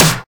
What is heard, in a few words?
clean snare drum sound d sharp key; snare drum samples